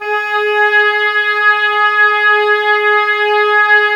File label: OBOE; Woodwind Brass Ensemble; Miroslav Vitous